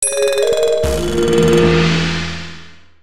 Chinese message; Nokia; Audio themes

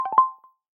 Alert charging, Nokia Lumia 1520; Lumia 1520 South Africa

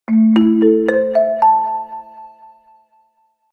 Xylophone; Bluboo; Xfire-5.1

